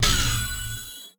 Force Field On

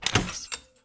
Book Latch Open Close

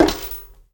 Maint On Stone Walk; moul

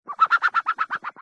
Rand Bird; moul

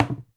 Cone Stn Hit

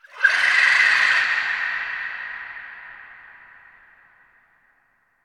Bahro Scream; moul-assets

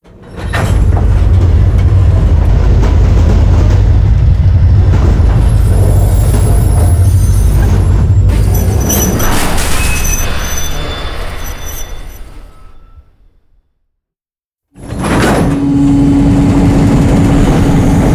Harvester Silo Dock

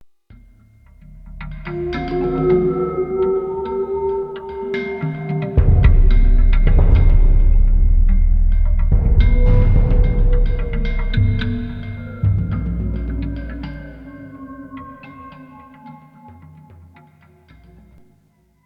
Rand Music

